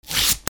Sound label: RIPPING